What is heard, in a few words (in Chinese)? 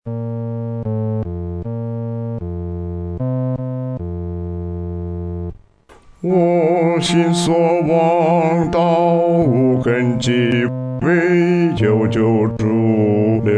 独唱 第四声; 坚固磐石